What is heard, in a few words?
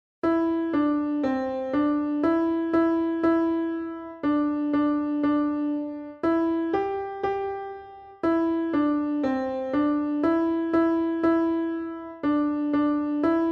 Timbre Piano Melody